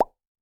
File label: Pop